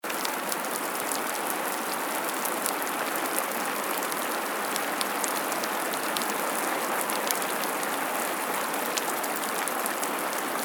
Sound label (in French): Pluie